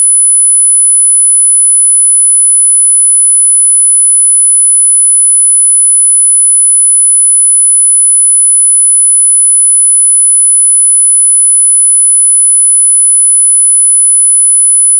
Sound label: 10khz